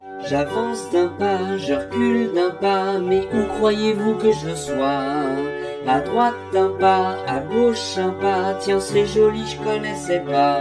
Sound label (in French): refrain ralenti; Refrain chanté